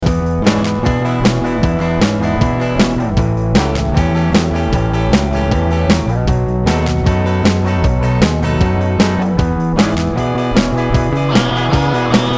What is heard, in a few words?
rock